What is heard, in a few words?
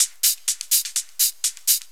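drum loop 125, hh+filterloops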